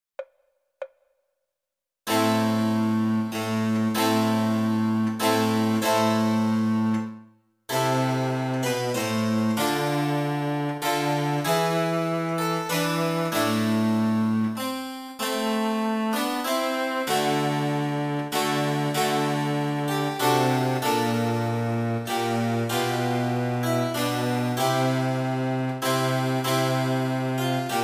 oboe sonata a-moll; telemann

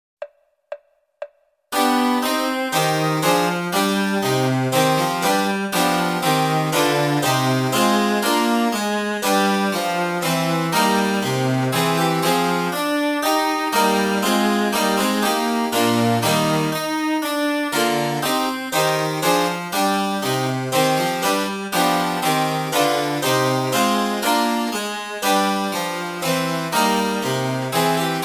oboe sonata; handel; hwv366

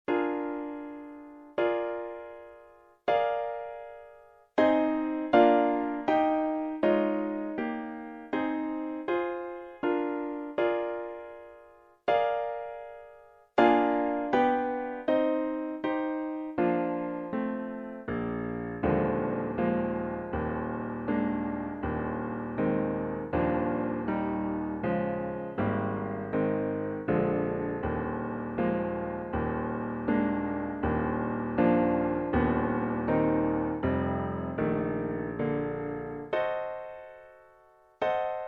clarinet sonata; brahms

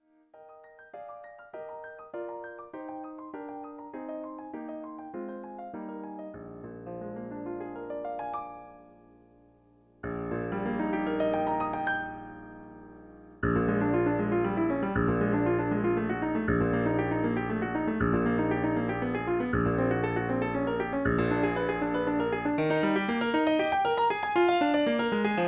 oboe sonata; saint-saens

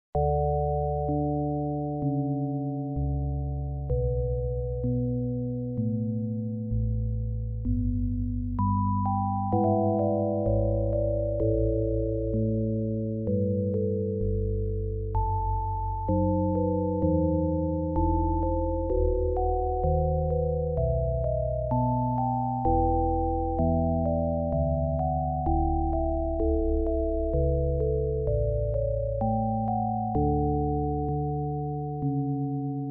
air bell

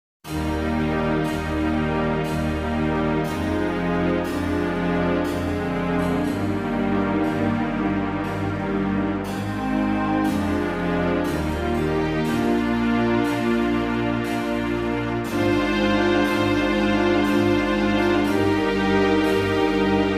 strings; ombra mai fu; es-dur medium

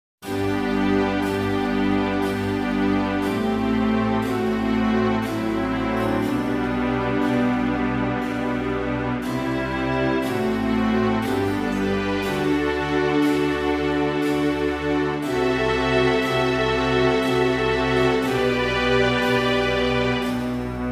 ombra mai fu strings G-dur high

